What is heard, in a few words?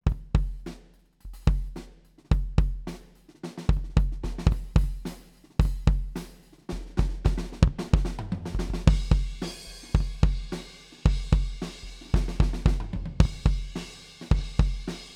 kick head off close U47 1ft